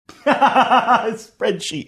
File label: laughing saying spreadshee